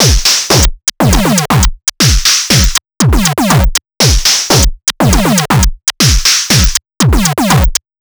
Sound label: Blaster(120), Processed